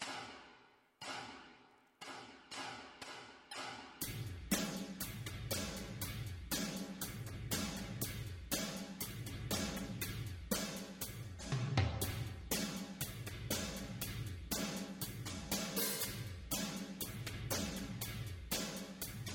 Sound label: HAPPY BEAT 120 bpm